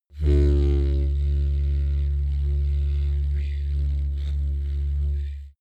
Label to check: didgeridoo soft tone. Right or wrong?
Right